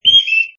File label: Cuckoo, Cuckoo calling: Classic 'up, down' sound, high pitched.; Birds; Animals